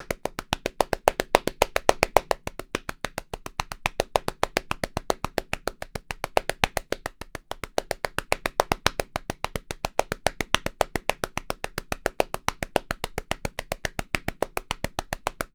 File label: Hands; War Cry (Cayovinto)